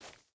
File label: footstep burlap stones jump start; Rust